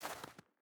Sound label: footstep burlap snow walk; Rust